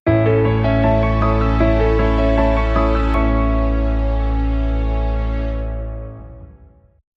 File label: Soft Tension, Sting